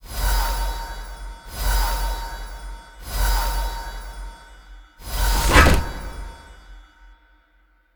Boss Ancient Guardian blue, monster

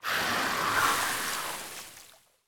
snakeboss idle, monster

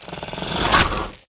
ice nipper lug attack, monster

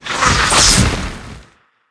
attack, monster; mosqueen

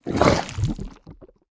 swamp giant damage, monster

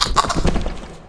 damage, monster; mosscyther

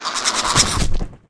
moss cyther dead, monster

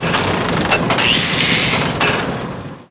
evil machine attack

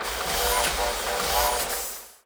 robotkid idle, monster

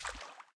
walk water

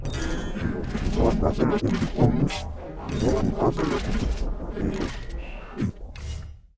idle, monster

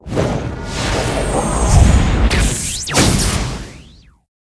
guardian attack, monster

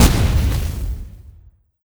boss dreadnought sexplo, monster; steamer crazy

